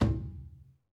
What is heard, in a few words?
Skin Drums